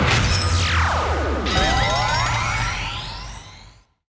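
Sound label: fukurou cure, owl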